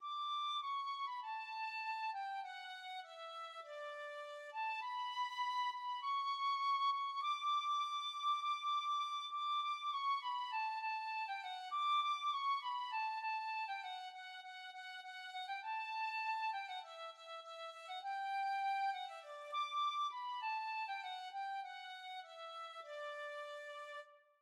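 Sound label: penny whistle, tin whistle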